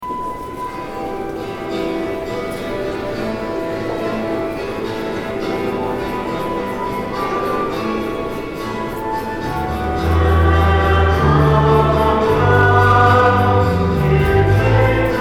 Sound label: With New Flute Part; Lamb of God